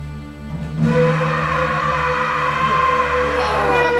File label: strings concert; smus cross campus; summoners call championship